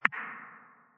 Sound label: power Click